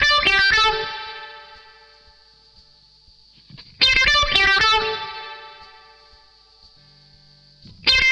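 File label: DISCO guitar LEAD, 118 BPM, D; Drumdrops; Compact Disco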